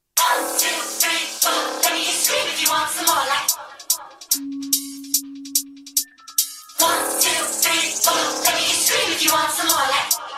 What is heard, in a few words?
Remix